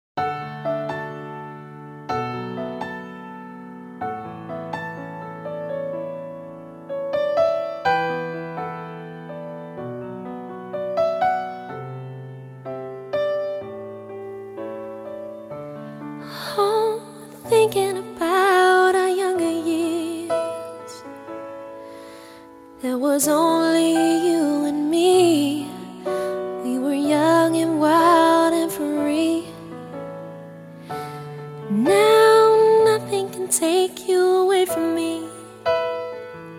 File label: piano mix